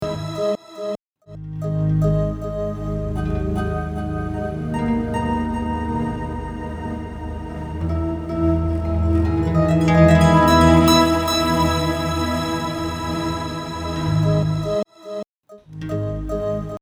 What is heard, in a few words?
Up an Octave Down An Octave